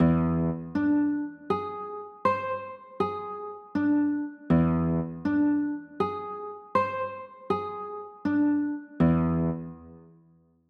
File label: e 7 sharp 9, chords